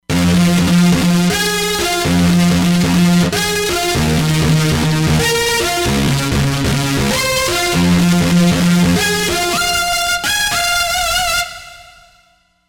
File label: Distortion